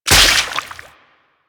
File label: Wyrm Bait Burst; Warframe; Infested Eris